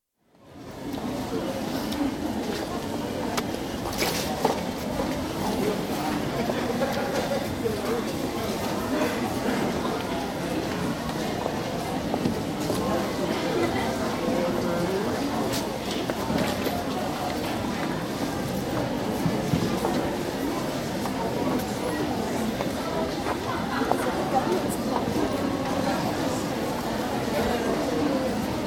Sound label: Indoor Market, general chatter & footsteps, distant rumble of occasional traffic; Crowd Sound Effects; BBC